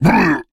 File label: Creatures Fight Damage; mighty party 2017 gamerip pc ios android